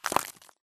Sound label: bullet light ballistic concrete debris; Titanfall 2